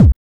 HSE-BD

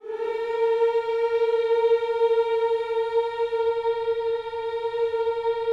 HMMS A#, Gospel; Spectrasonics; Vocal Planet Vol.1 Gospel; akai

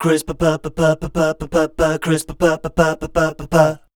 SCAT #D, Voice

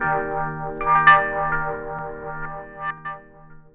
RHODES; E-Lab; X Static Goldmine 2

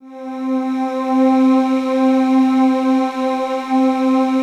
PAD, Pads; Best Service; XX Large

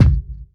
BDRUM; E-Lab; X Static Goldmine 2; akai

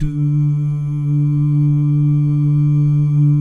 "doo" guys, Vocal Jazz Fx

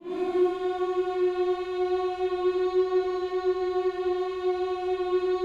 HMMS F#4, gospel; Spectrasonics; Vocal Planet Vol.1 Gospel; akai

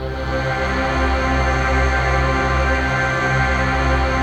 ATMOPAD; Best Service; XX Large; Pads

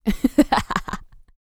LAUGHS, Vocal Planet Jazz Fx

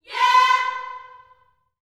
"yeah", gospel vocal, C5; Spectrasonics; Vocal Planet Vol.1 Gospel; akai

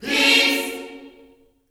PEACE CHD, Vocal Gospel; Spectrasonics; Vocal Planet Vol.1 Gospel; akai

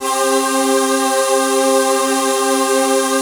VOICE PAD, ATMO PADS; Best Service; XX Large

